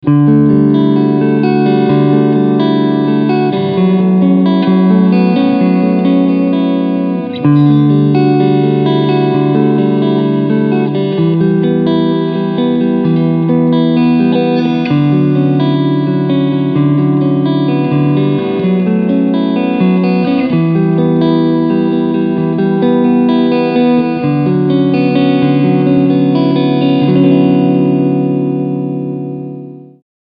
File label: Clean Front